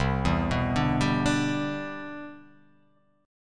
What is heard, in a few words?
C Major 9th C down; arpeggiated; CM9